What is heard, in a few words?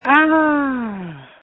Scream